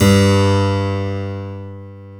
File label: KEY HRPCR, Roland; Roland - LA Composer vol. 1